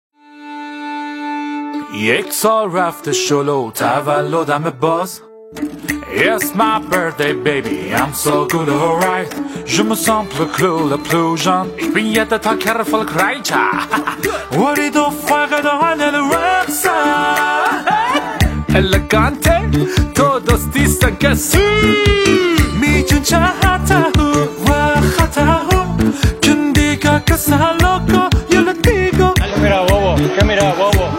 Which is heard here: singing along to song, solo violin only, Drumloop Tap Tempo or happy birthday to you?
happy birthday to you